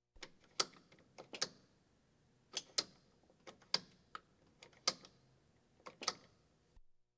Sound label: Police, Fire engines